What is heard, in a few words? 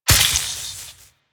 Vial Poison Shatter; Warframe; Alchemist